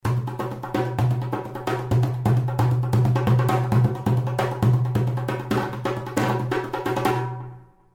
Persian Drum; ceramic drums

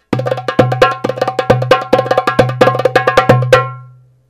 Arabic Drum; ceramic drums

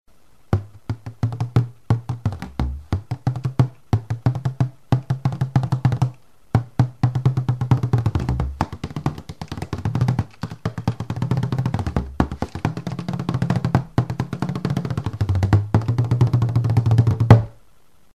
bodhran

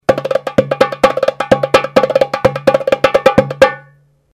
Persian Drum; ceramic drums

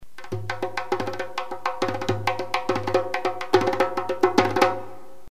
Zarbuka; ceramic drums

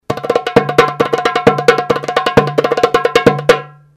Persian Drum; ceramic drums

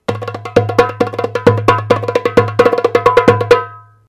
Arabic Drum; ceramic drums

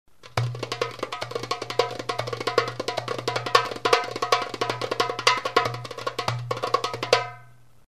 darbuka

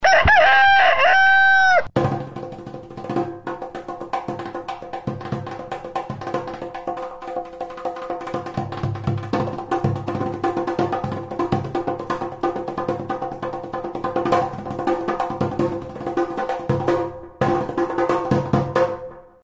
Chicken Drum; ceramic drums

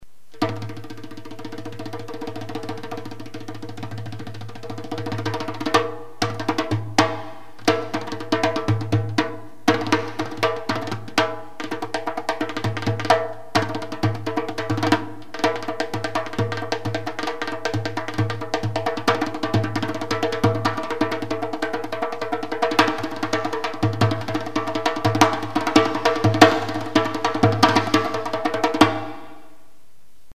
Big Drum, ceramic drums

